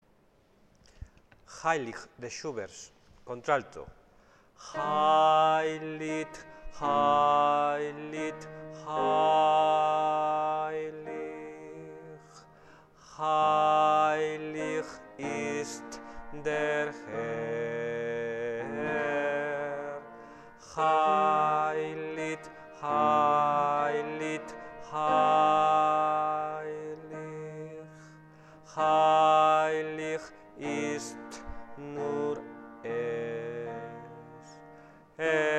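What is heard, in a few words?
alto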